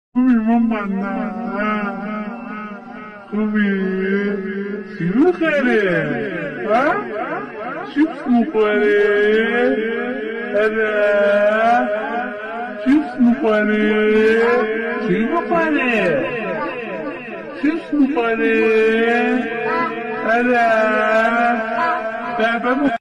new music tanze khandedar remix

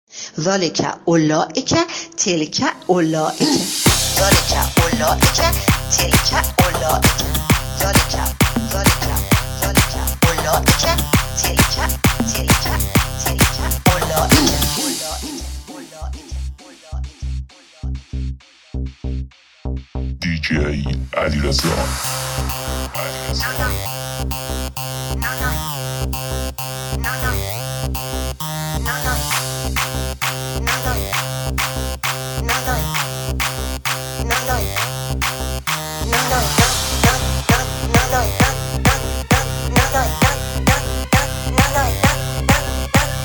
new music tanze khandedar

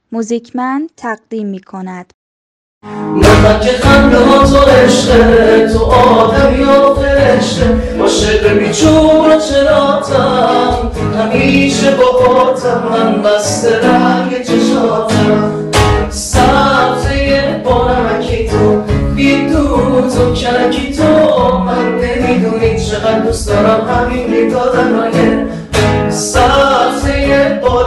music hamkhani gorohi

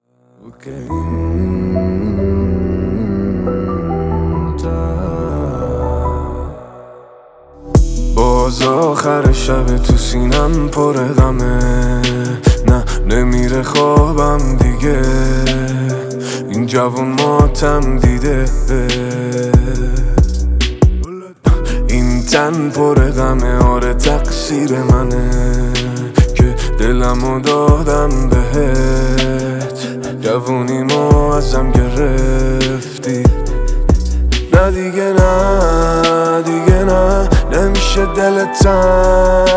sad music

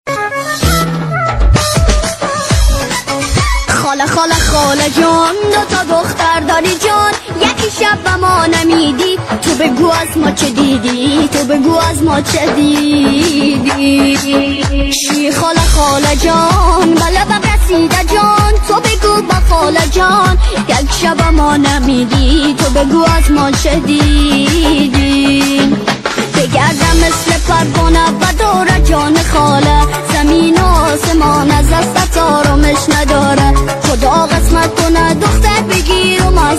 music afghani sedaye bache remix

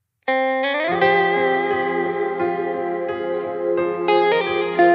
music torki azari

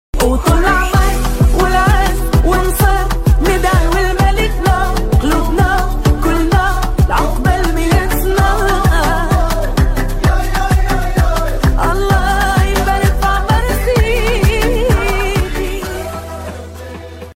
tavalod arabii

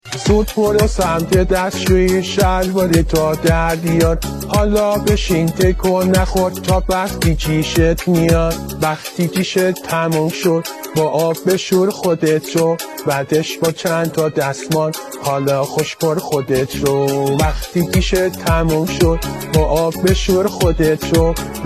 new music tanze khandedar

